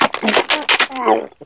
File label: quake sound